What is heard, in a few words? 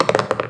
pocket; Virtual Pool Tournament Edition